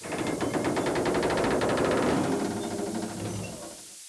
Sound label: windmill; dod